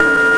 FAILURE

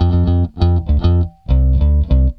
BASS, ROCK POP; MAGIX

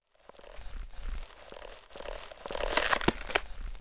BOOK; KRONDOR

